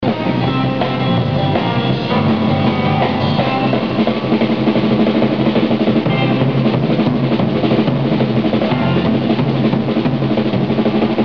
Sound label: wipe, drummer